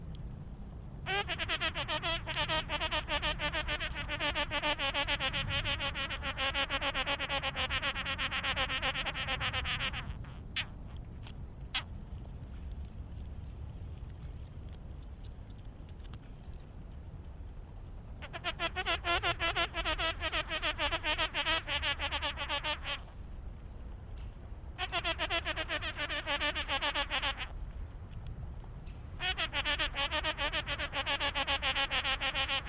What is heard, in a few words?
Sphex funerarius, Heuschreckensandwespe